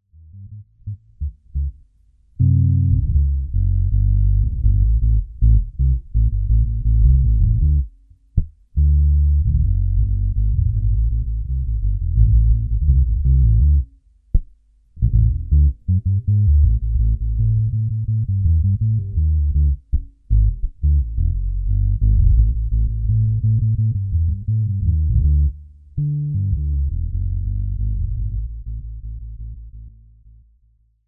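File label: 1962 EBD neck, bass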